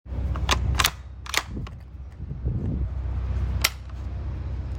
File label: Springfield, Deactivated Springfield 1903 Bolt Action Rifle; UK Old Spec; Lockstock Deacts